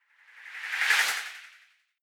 whoosh simple flutter phase high

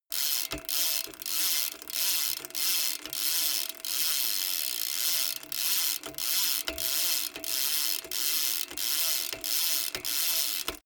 bmx bike crank freewheel fast short sequence

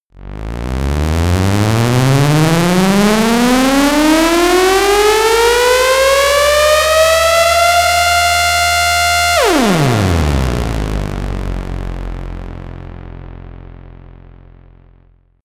bass synth siren

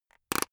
plastic slowly pressured

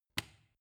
switch turn on hard

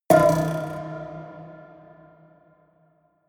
haunted strings buzz high

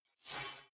fly by digital shards short

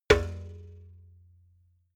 metal bucket hit

